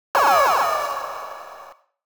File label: Coin; Night Striker